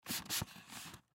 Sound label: Cardboard Box Small; Cardboard Box Fast; Materials; Foley; Blastwave